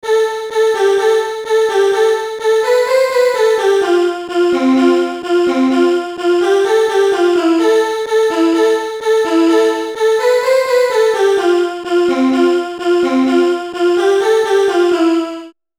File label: Arturia CMI V Pad Stabs